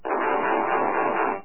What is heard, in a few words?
hut burn sound; trogdor canvas